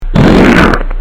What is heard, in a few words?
fart